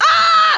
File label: death; quake2; kyle